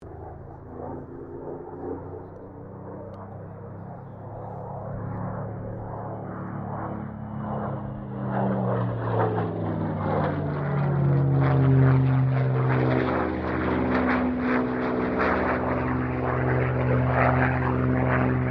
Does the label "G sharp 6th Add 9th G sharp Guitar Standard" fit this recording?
No